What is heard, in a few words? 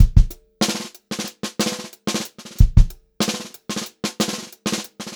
groovy beat, Funk-Groove Drumloops; USB Soundscan vol.38; AKAI